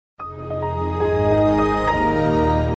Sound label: Message; Xp